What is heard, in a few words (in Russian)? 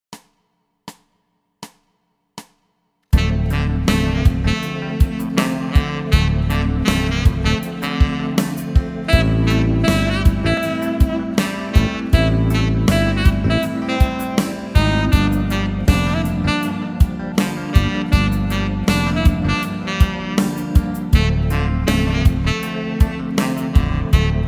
Track plyus potom minus